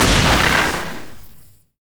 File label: roboraid enemy explo summed